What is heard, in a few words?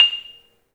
MARIMBA; 3D Sound; 3D Keys VOL-1